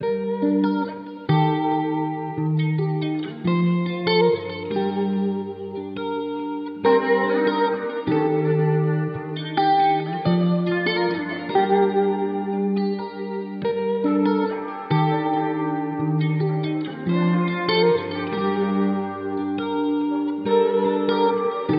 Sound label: Journey Guitar Loops, 141 BPM G Min; Solemnly; Cymatics